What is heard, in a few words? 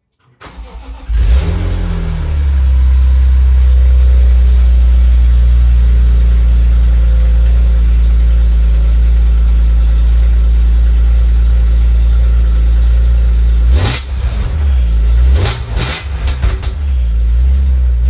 bmw m3 e46